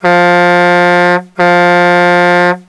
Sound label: truck horn